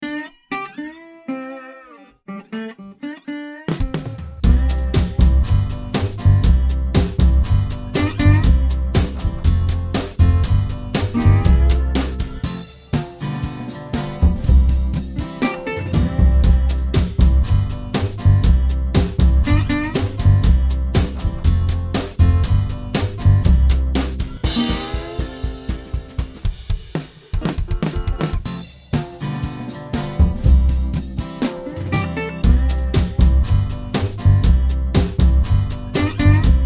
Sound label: Barnyard Song NO MELODY, ukulele in c